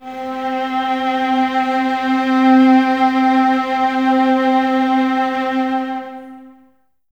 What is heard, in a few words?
violas C 4, String Ensembles; Miroslav Vitous; VLS 1+2